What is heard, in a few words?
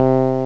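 Electric Bass (finger) (C2); X-Wing; X-Wing Patches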